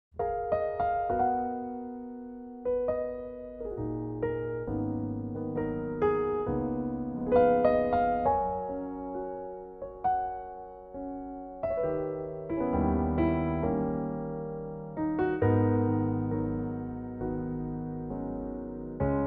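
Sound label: Gb piano, male; key - Gb to Ab - range - Gb to Eb; Piano only arrangement; Pianotrak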